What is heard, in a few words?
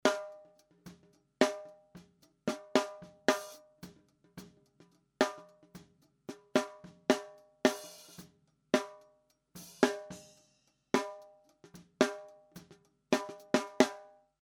Snare